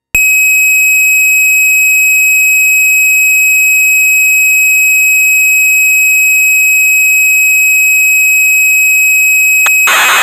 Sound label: kawai sx 210; SX-210 banks backup